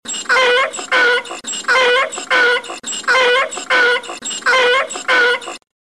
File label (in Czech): osel domaci asinus